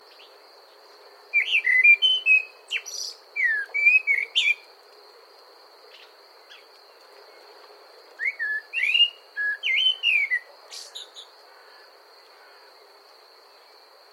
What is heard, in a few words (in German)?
Amsel Gesang